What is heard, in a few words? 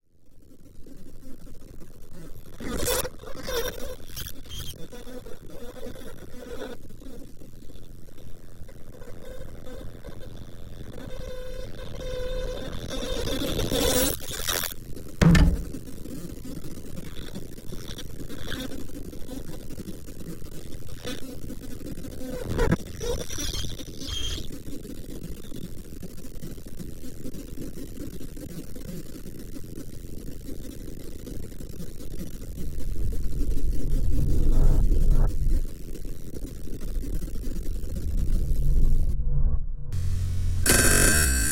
soundscape